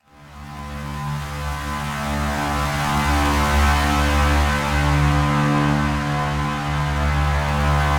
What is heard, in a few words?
Texture, Pad, Drone; Fog Of Time Texture